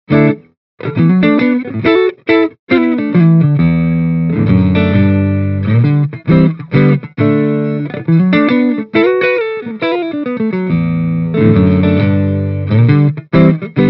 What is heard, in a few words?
Mini Rox Mic Inside Nordik Gjallarhorn Clean Stratocaster